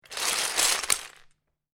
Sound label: Lego, grab several lego pieces; Miscellaneous Toy Sound Effects; LynneMusic